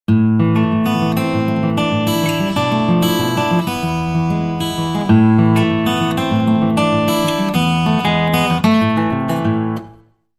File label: Intense Guitar STING